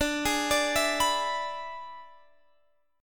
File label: E 7th D, guitar